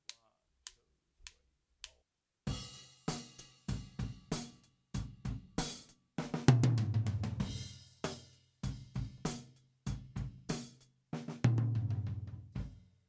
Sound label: Comp Rtom; Drum Recording Setup